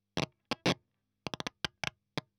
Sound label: EL Fuzz End